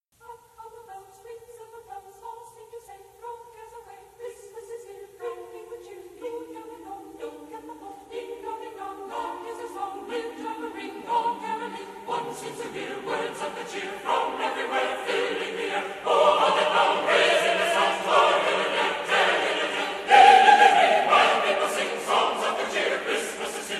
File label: Chorale; Carol Of The Bells